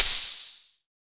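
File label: FX synth; Sound & Vision - Gigapack II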